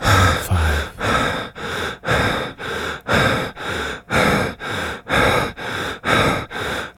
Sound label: heavy breathing loop; the sound of fear